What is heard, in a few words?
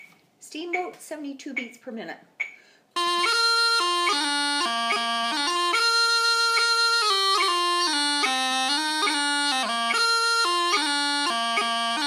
72BPM, pipers music; Steam Boat